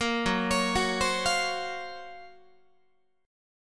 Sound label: Gb Major Sharp 11th Gb Guitar; GbM#11 arpeggiated